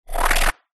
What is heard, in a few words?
science fiction electricity spark surge; zapsplat